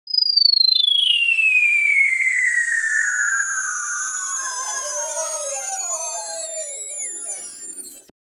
a space shooter sound

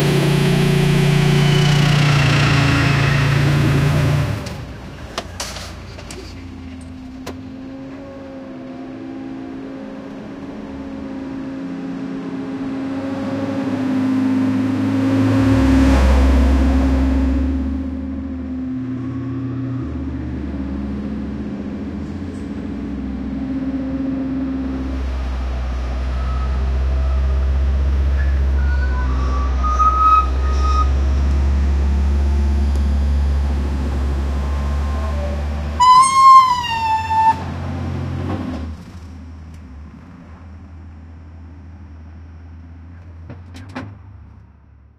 soviet ww2 truck moving

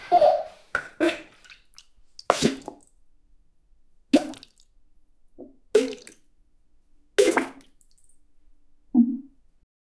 make a sound teen girl, toilet

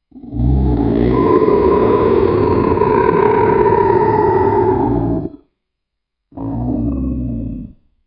tyrannosaurus rex roar

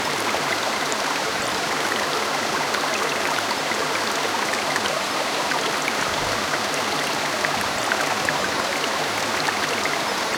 natural stream sound, forest

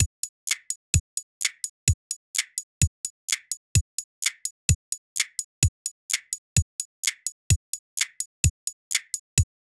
casino reel sound, mechanical clicks

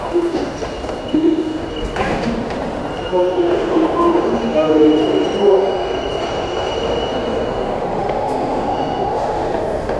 lively 1900 paddington st, announcements

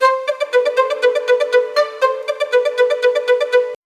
sneaky pizzicato strings

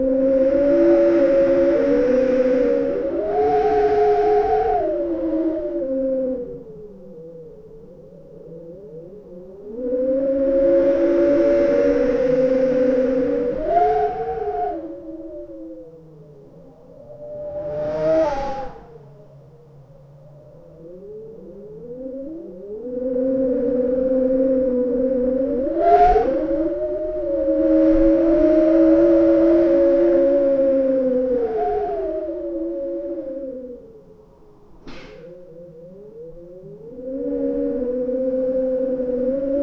scary wendigo howl wind

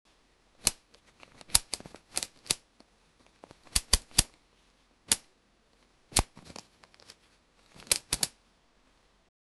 natural sound of a person, armor

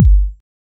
the atmospheric sample of, techno

